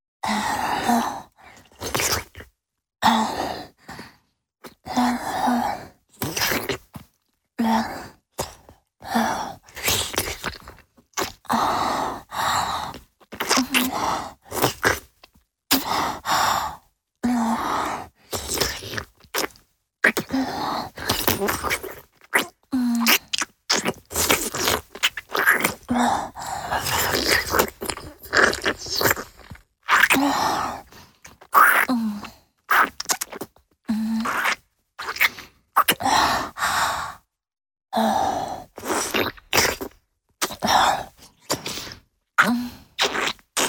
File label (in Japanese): チュパ音, 高音強め; フェラチオ(中):亀頭・陰茎を舐める; チュパ音抜粋集